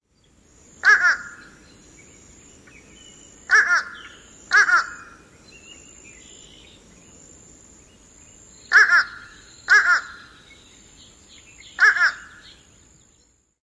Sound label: two-note call